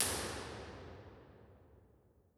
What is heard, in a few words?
Real Places Church; Igny; Impulse Responses; Ableton Live 12 Lite; Hybrid